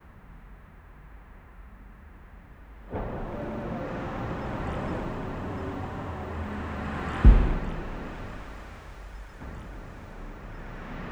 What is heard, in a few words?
the sound of a room, city